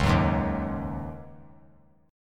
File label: Db Suspended 2nd Suspended 4th Db, chord; strummed; JGuitar